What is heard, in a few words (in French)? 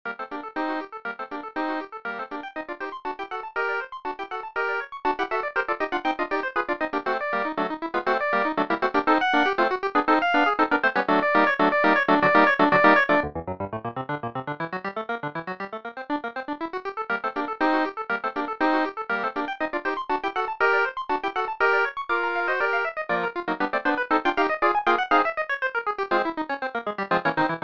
toccata pour harmonium orgue